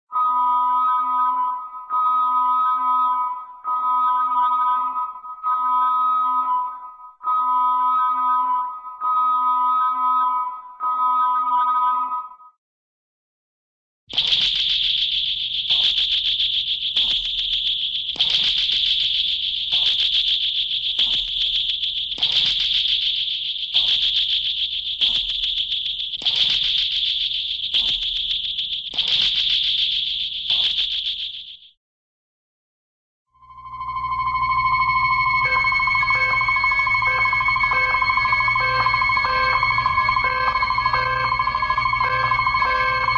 sci fi emergency alarm; cartoon

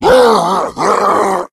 hulk pain fire; Left 4 Dead; Source Engine; tank

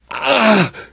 death scream, survivor

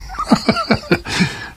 laughter; source engine; left 4 dead 2; namvet

